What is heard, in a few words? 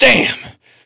pain, player